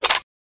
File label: c4 open; czero opforce